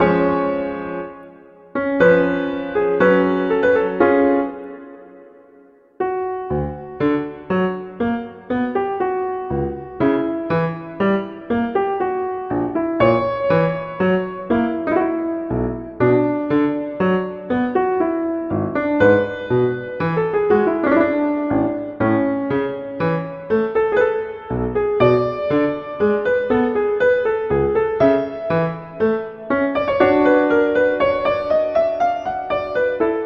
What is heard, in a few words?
Adagio; Haydn; Sonata 30; Hob XVI 30